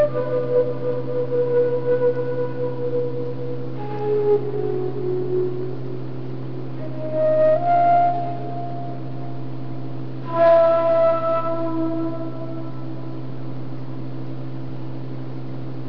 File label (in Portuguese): sopro